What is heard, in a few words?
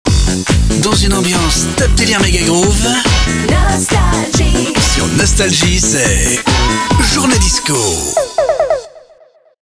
disco jingle